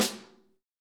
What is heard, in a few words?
SN ambient; Best Service; Real Mega Drums VOL-1